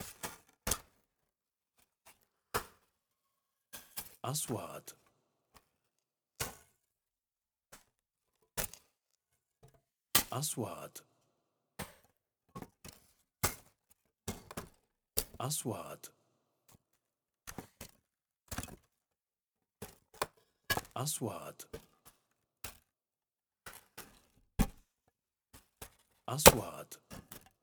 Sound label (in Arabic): حفر يدوي بأرض رملية; sound effect; رمال